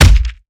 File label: Melee Impact Base; Evolve; Behemoth